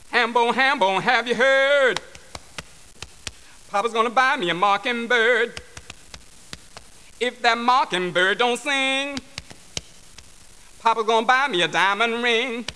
Hambone; Gullah rhythms

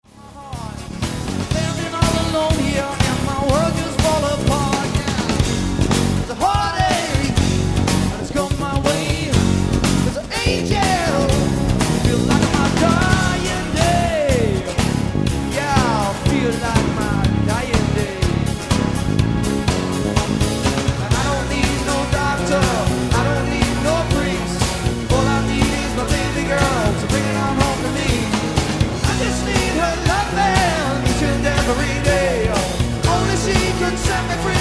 scream

